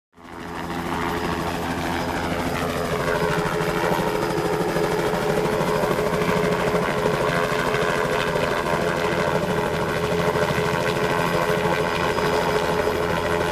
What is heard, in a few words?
Helicopter in flight